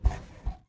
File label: Capsulte Bounce Soft; Puddle; puddle plus ios gamerip 2018